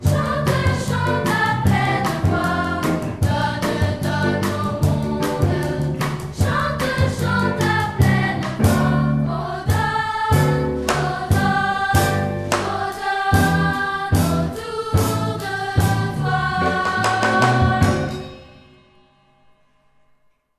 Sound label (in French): donne donne donne voix polyphonie